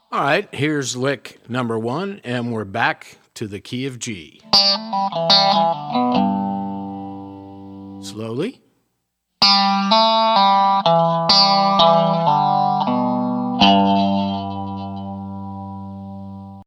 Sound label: resonator pedal, steel guitar